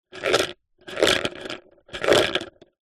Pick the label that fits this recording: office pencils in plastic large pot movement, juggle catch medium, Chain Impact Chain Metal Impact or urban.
office pencils in plastic large pot movement